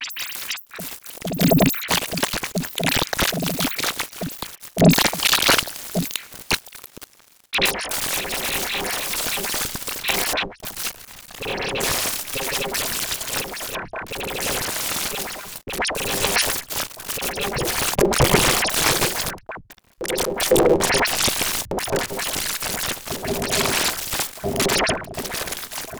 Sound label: Wet Data Hits, Sci-Fi Interface; Construction Kit Vol. II; Sonic Bat